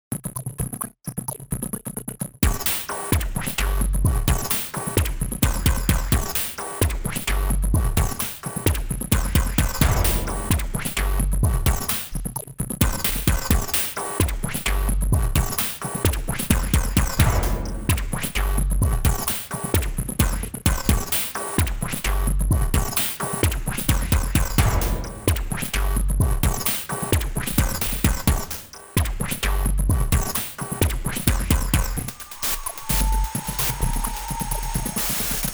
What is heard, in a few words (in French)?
percus galactiques